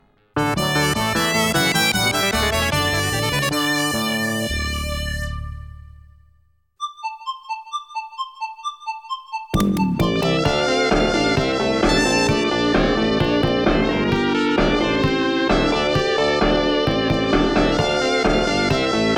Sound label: Fanfare, Ending; Taito 1500 Box; Scitron 5th Anniversary